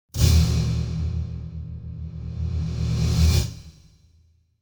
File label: Drum Metallic Hit Reversed - Final Hits; Hollywood Edge; sound designer's toolkit v2